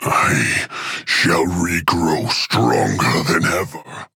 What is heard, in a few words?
Death; Grover; Paladins